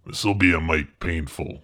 painful

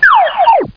cartoon

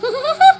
gigle

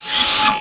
VDU on; Forsaken

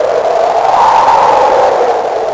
sandstorm; Desert Trek 1.02

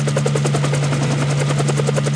rotors; Chopper2K; wraith

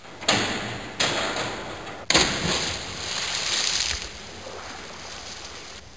Swimming; Soundsation; Human

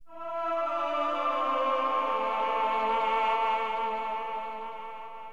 TRON VOX, Keyboards, HipHop; LiveAct Pool 1

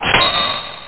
Sword

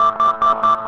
WPN IR CHIRP ON; PC Gamer IT CD 47; USAF; USAFDEMO

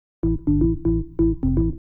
organ house 125 A; eJay; Keys

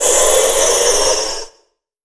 explosive; Earth and Beyond; EA Games

